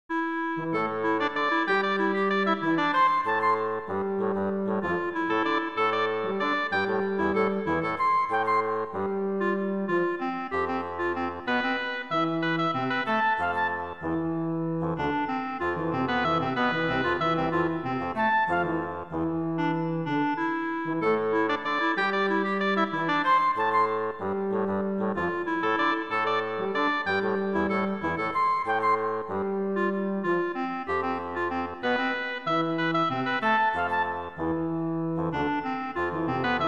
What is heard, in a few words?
variations for woodwind quintet